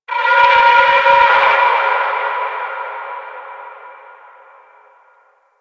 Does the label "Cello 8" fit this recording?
No